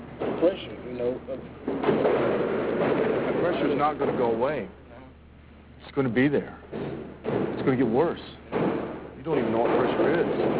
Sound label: tough sound